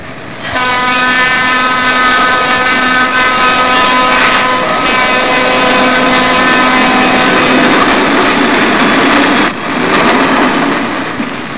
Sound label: train